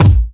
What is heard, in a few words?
puffy top end kick single shot a key; kick drum samples